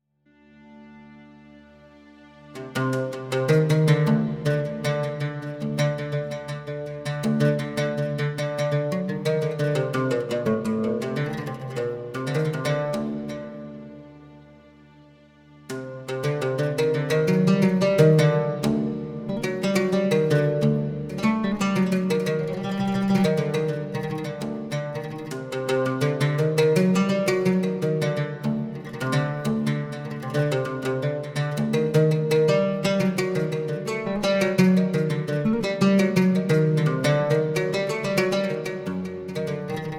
arabic oud takasiem

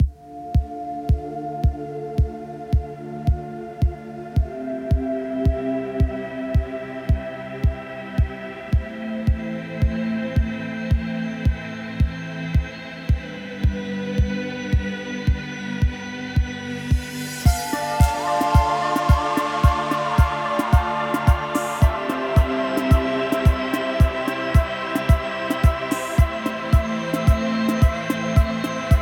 howl

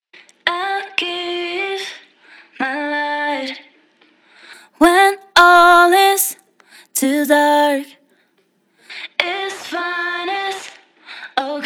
female vocal telephone slap

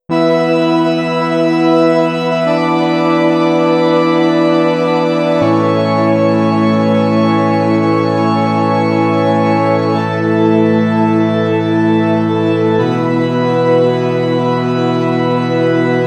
tubular beauty

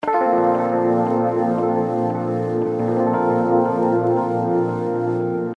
loop synth; cherryspace

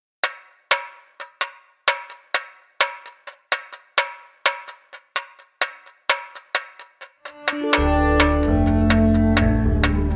space tamborim

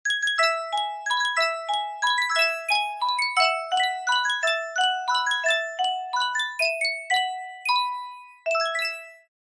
music box

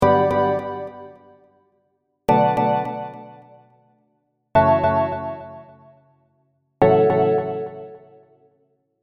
serum chords; chiqui audio

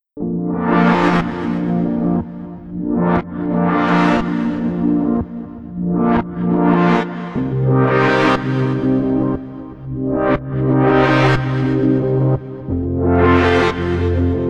fx holdchord noise

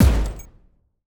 chain stomp; nova sound

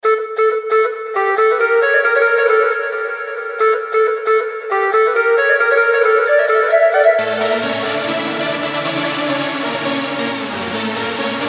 mix all melodies jewish

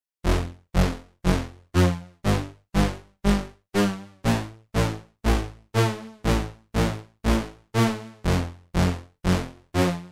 monobass

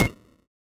destro perc; nova sound

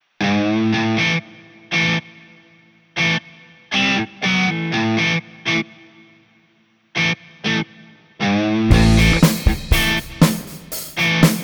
blues rock

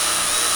electric drill loop

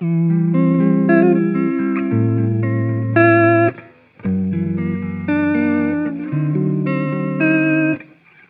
113bpm processed

